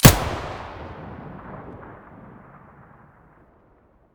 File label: vihr muzzle stereo, weapons